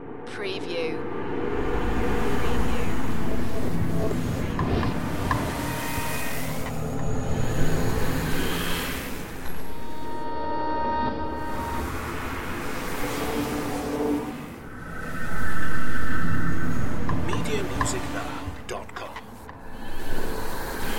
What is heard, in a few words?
dimension glitches ambience loop